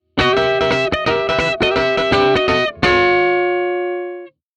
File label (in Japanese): カッティング